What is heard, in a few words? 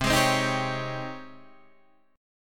G sharp Major 11th C